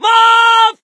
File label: scream while pounced, survivor; source engine; left 4 dead 2